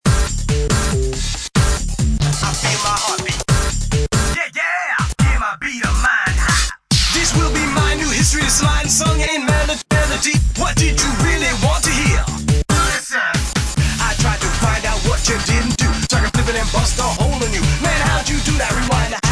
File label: beat; Rap